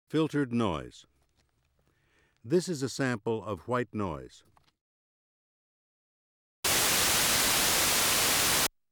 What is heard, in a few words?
Filtered Noise; ASA auditory demonstrations